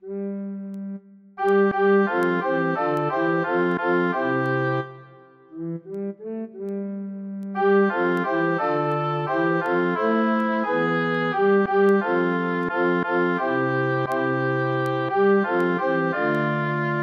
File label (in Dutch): preces alten